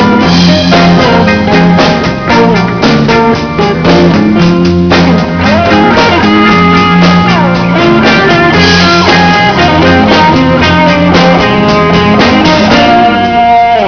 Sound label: drums